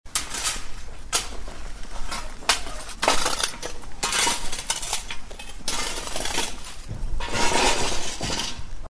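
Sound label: sounds of shovel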